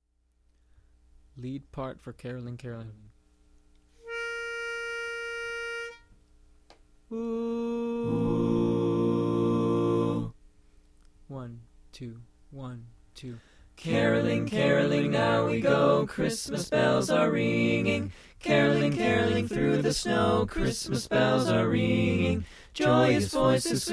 caroling lead

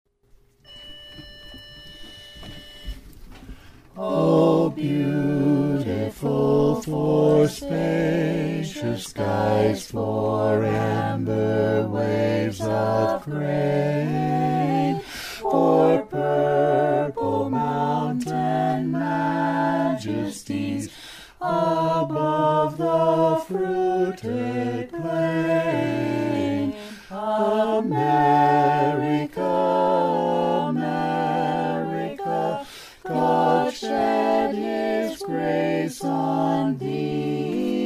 america bass

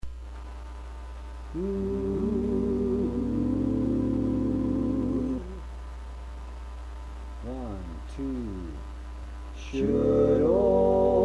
auld lang syne lead

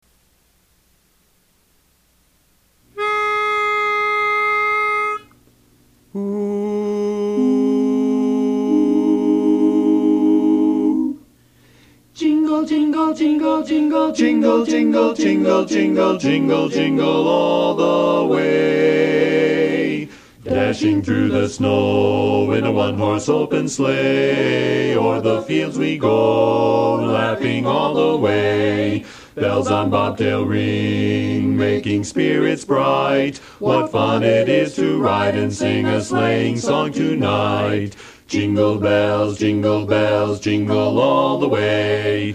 jingle bells bass

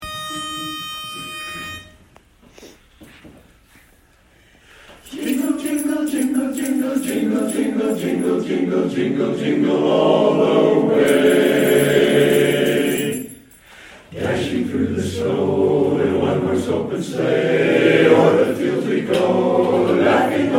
jingle bells

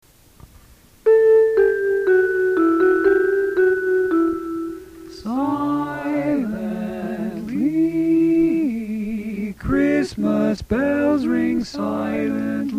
christmas bells lead

